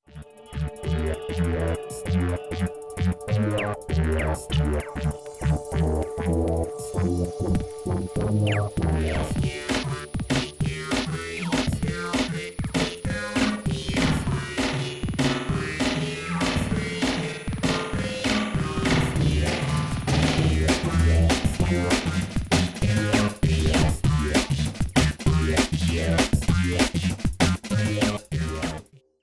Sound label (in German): System 500 572 Maximum Audio Delay und Pfeifen 10-20 kHz